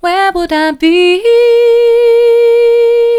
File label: where would i be soft, vocal